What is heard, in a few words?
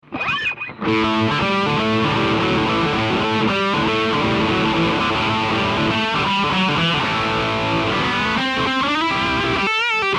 Alnico II Pro Slash drive